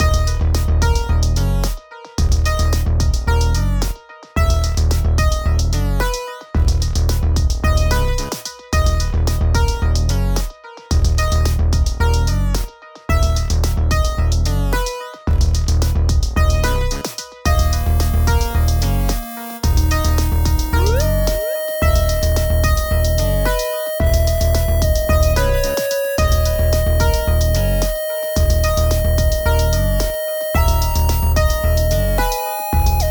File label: loop